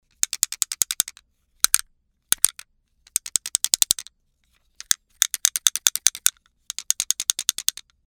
pachipachi

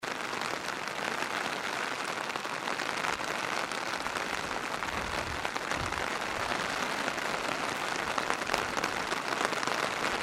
rain

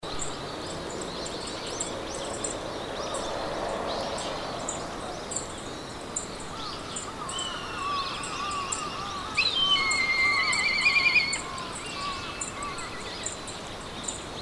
bird

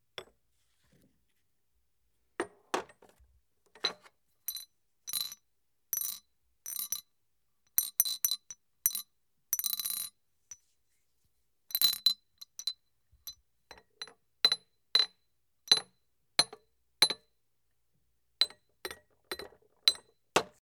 ramune

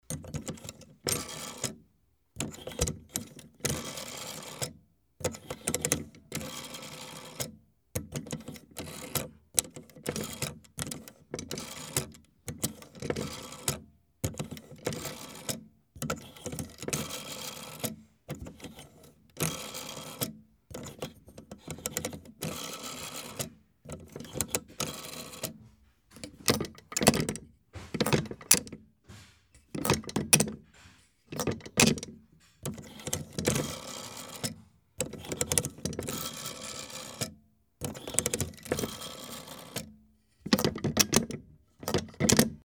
old telephone

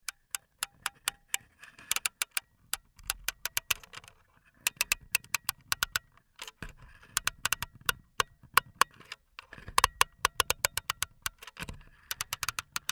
spring winding

